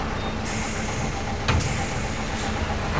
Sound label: gear